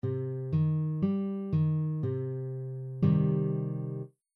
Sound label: triad c minor